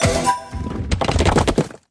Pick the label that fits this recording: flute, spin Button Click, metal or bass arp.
spin Button Click